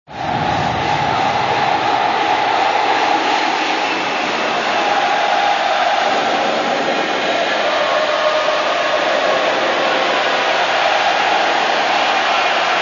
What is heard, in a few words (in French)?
ambiance supporter